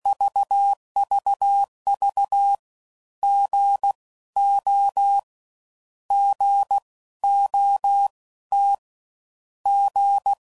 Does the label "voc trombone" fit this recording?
No